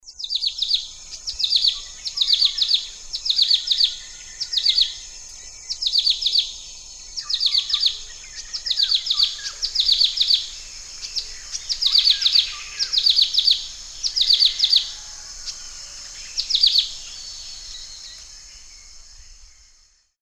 suara prenjak rawa

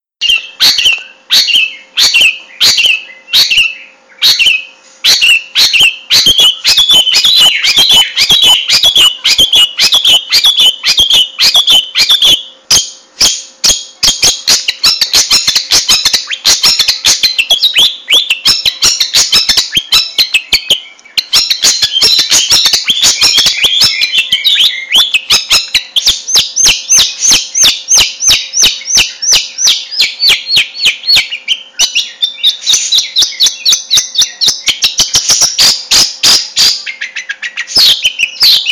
suara cendet super speed

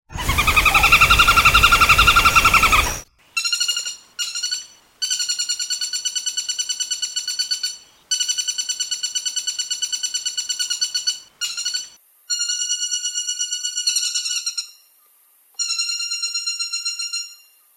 kombinasi pelatuk bawang tunggir emas

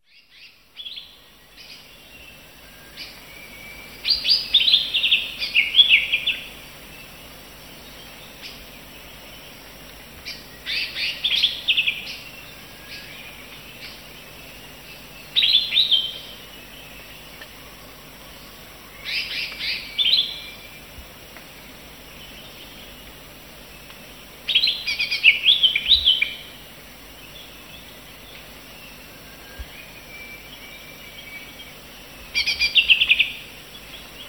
suara cucak hijau betina

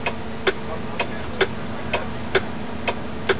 suara mobil

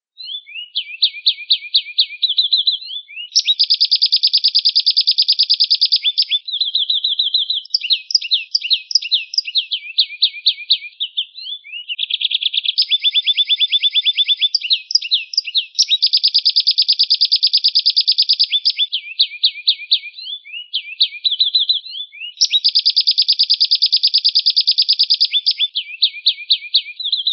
keluarga kenari

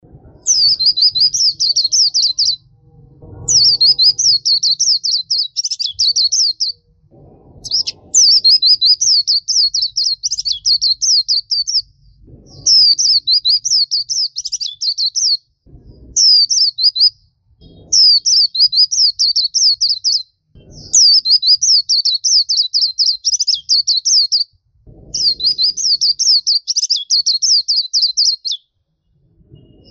suara kicauan pleci auriventer

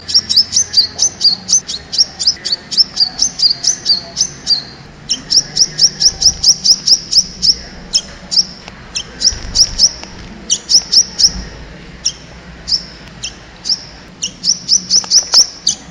kolibri kelapa

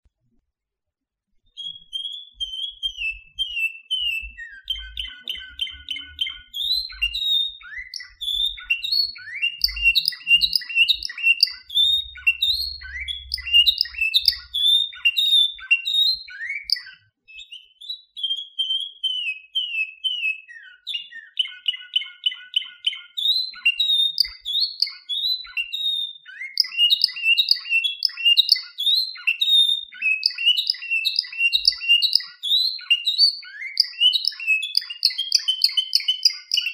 sample suara kenari spanyol

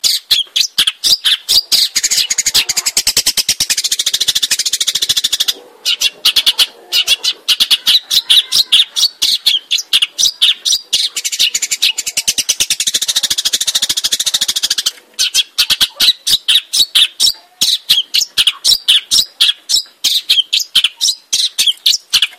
suara cendet rapat

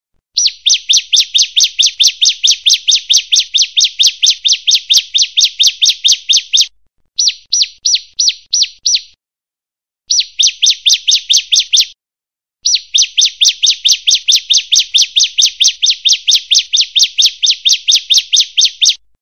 ciblek betina

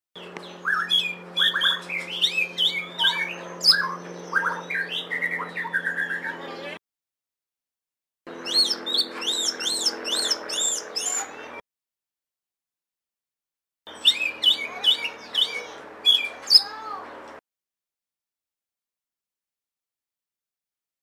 audio burung kacer lutung cebu

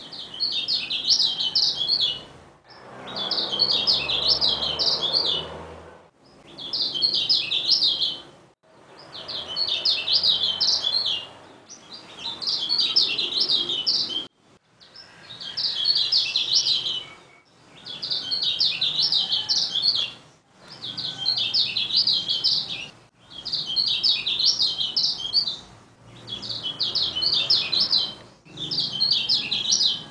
pleci lombok

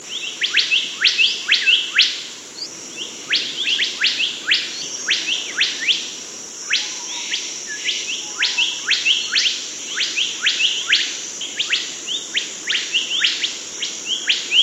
burung bimoli

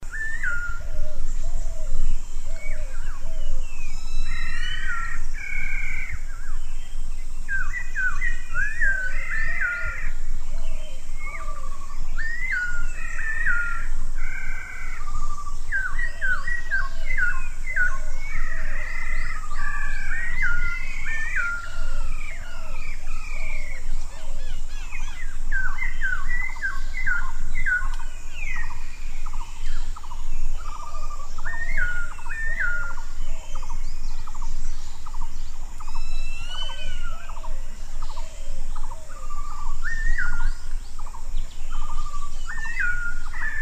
suara poksay punggung merah

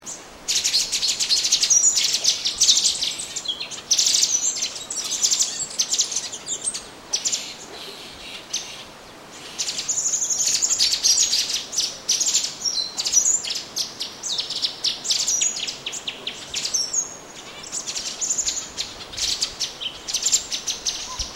suara burung cucak cungkok orange bellied leafbird

